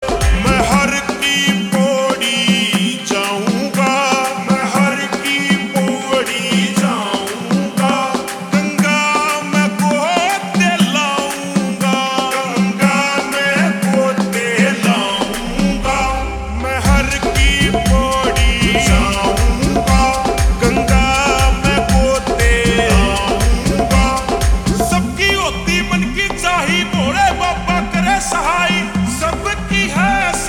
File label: Ringtone Slowed Reverb